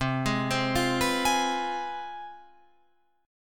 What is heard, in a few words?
C Major 7th Suspended 4th Sharp 5th, C, down, Guitar, Open F; arpeggiated; CM7sus4#5